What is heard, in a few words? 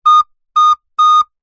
musical instrument recorder 3x toots high pitched